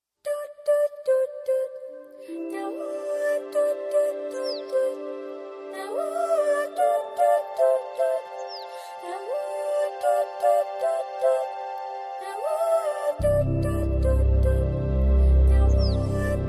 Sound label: BGM Ringtone